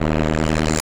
Static; Blastwave

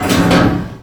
Drop Hatch Stop; Doors

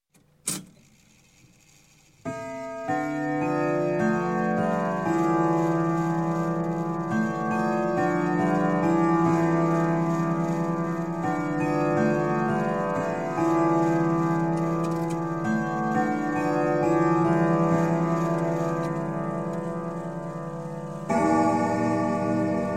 Clock, Grandfather Winchester Chime - Strike; Sound Ideas 2000 Series; Strike Seven O'cloc

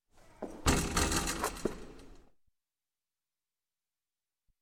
Basketball through Hoop; Sound Ideas 2000 Series

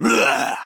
Ghoul; BLIND QUEST - The Enchanted Castle

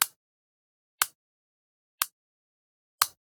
Generic Button Plastic Tiny

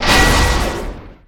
Pickup Armour Shield; Pickups

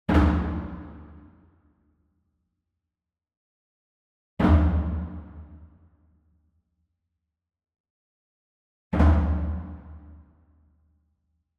SMALL DRUM Fontonfrom Flam Hard Beater Far; boom library; Cinematic Strikes Construction Kit

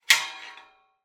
Iron gate, latch, sliding, rotating, lock, clang; Eiravaein Works; Vaeyan IV

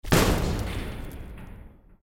Locked Door; Blind Quest - The Frost Demon

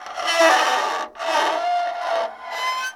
Scrape Metal On Metal; SFX Kit; Creaks, Scrapes, & Misc

